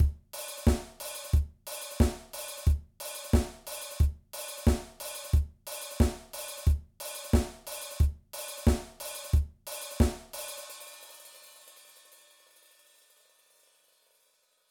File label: Basic Drum Beat